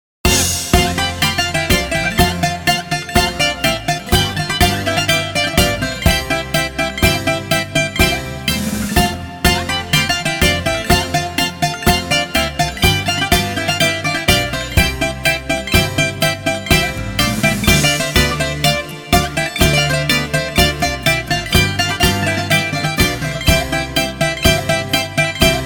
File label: Bouzouki Intro